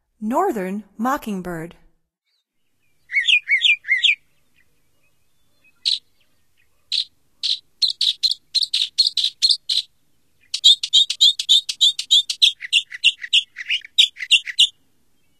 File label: Northern Mockingbird, Songs of Birds of North America; Stokes